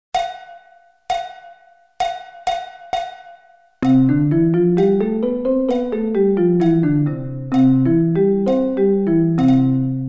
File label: C Scale